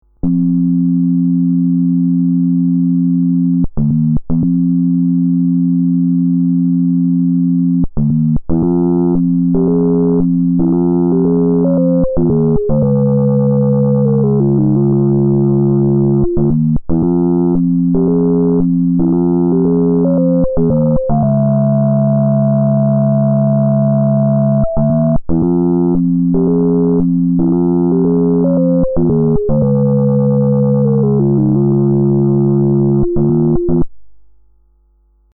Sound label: lowpass